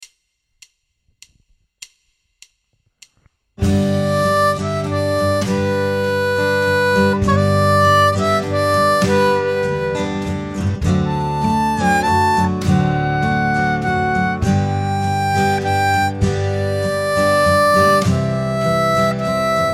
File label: G 100 harp